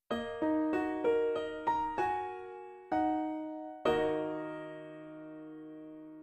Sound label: jingle dead; newts jingles